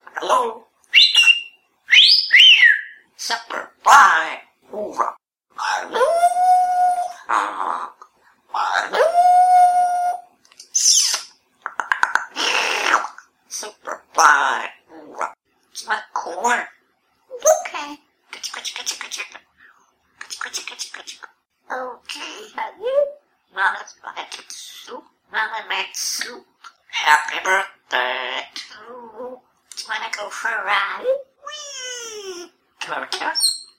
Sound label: Ring Tone